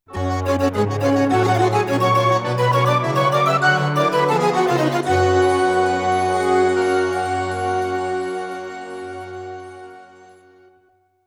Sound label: Magical Sound Logo SC-55; fatal fury king of fighters; x68000